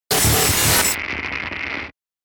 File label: hit blast; 5.1 STEREO MIX; Blastwave